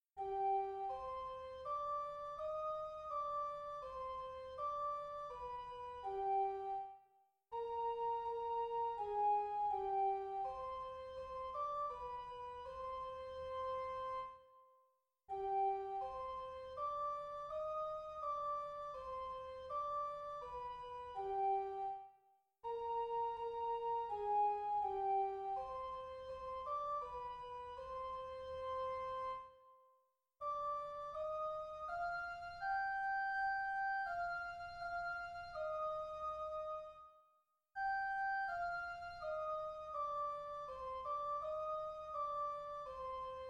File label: soprano alone; bach 93 sing bet und geh; choir; sing bet und geh auf gottes wegen bwv 93